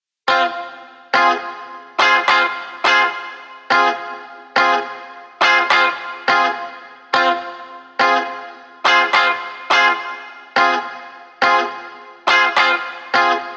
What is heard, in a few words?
140BPM Fmin Guitar FX, Offbeat Dub Guitars; Antidote Advent